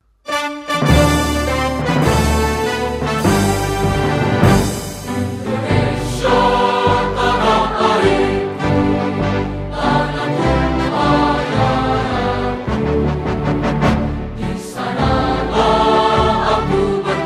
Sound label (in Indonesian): LAGU INDONESIA RAYA TEXT DAN VOCAL ORIGINAL OFFICIAL; upacara